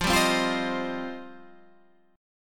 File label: F 7th Suspended 2nd, Guitar Half Step Down